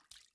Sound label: step splash